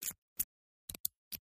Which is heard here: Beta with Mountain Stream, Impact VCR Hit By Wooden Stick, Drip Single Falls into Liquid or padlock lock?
padlock lock